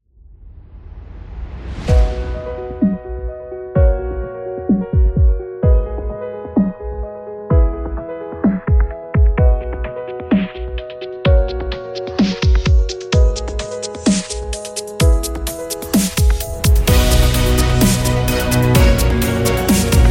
Beauty Cinematic Dubstep; epic action

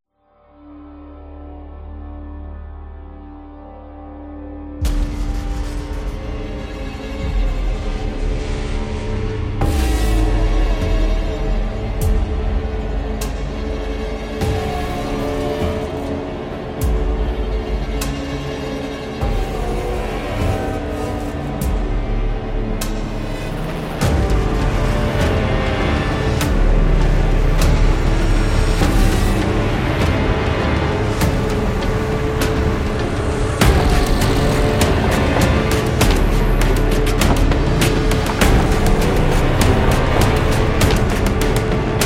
Dark

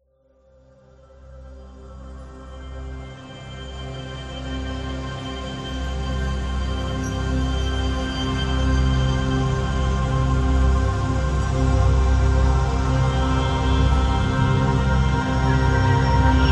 Relaxation, epic music; epic music vol 3

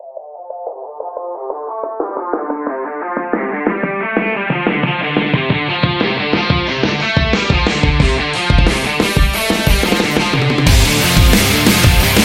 Metal

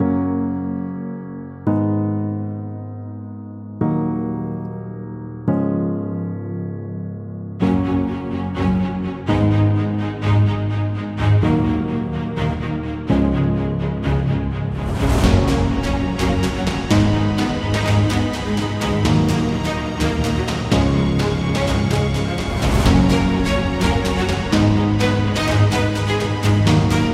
Action Adventure

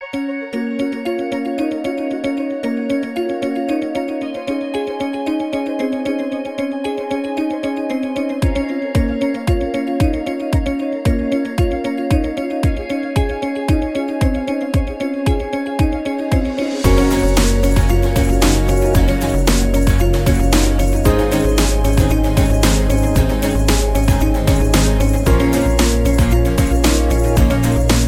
Playful Corporate, corporate music; corporate music vol 7

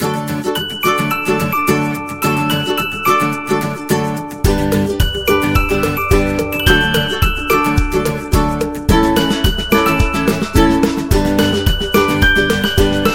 This Is Folk, happy music

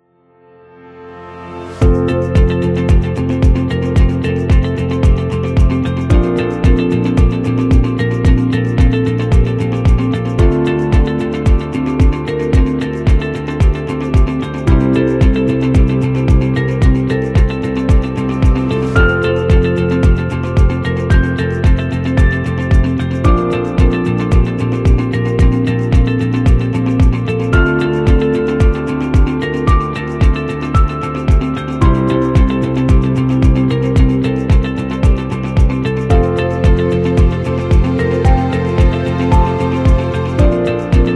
Calm Corporate; corporate music vol 12

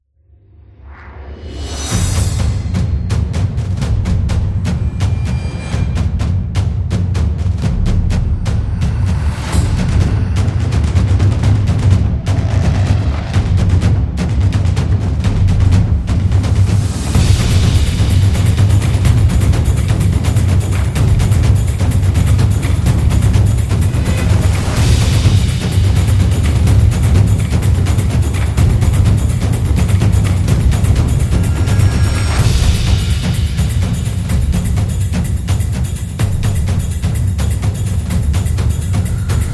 Japanese Drum

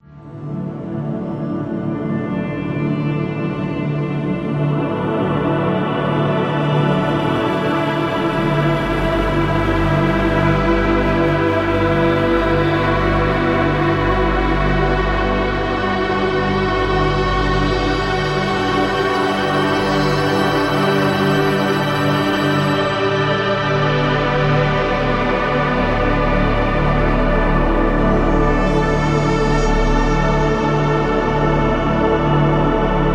Space Galaxy

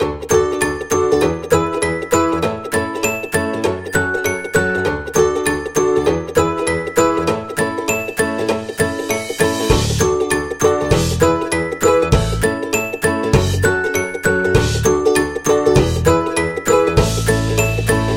Fun, happy music

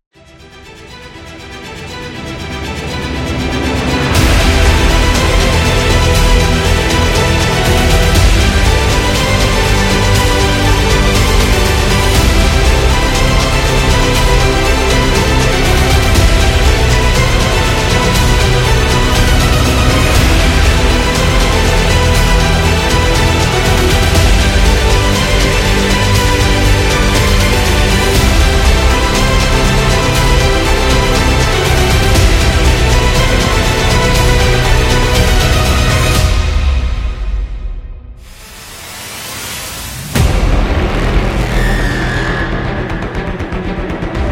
An Epic

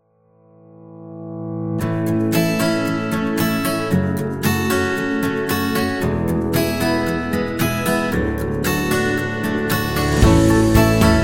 Sitcom; birthday music

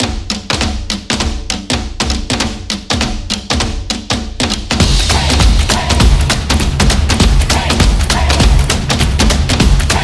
Beat, claps action

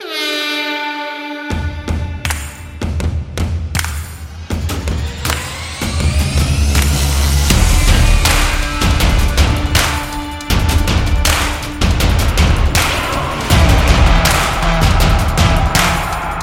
In Football, football music

